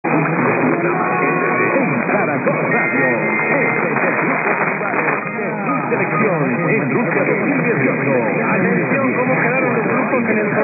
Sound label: Radio Colombia; 1150 HJFI Caracol Radio Armenia; Sauce Peru